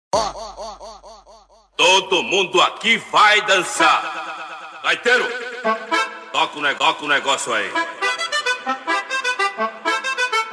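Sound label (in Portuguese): beat do gaiteiro; cd eletro funk maxximal fevereiro 2023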